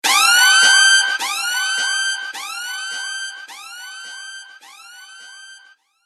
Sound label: dj hip hop siren